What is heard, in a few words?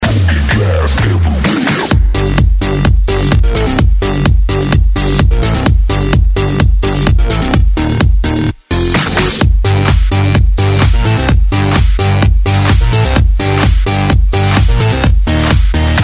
remix